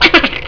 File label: giggle